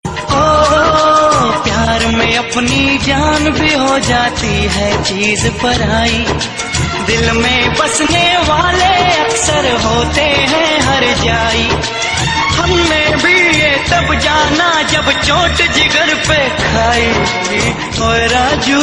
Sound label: Heart Broken Sad